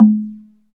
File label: Slit Drums, Africa, Wood & Metal